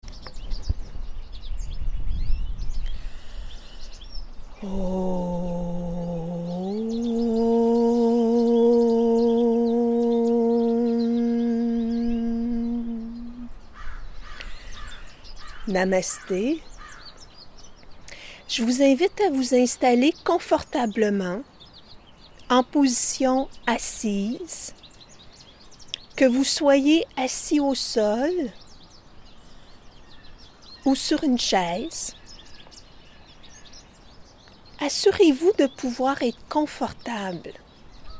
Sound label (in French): Méditation avec les oiseaux